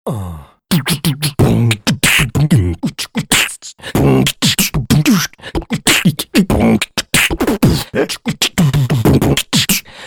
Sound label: human beatbox